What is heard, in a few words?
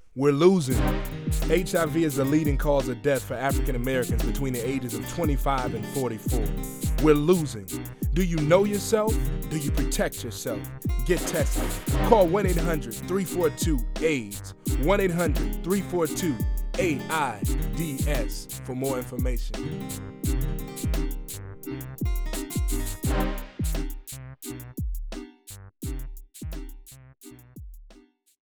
hip hop